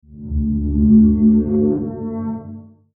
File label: Logo Dark, Logo Dark Warble, Logo Two Note Low-Fi; Blastwave; Sonic Logos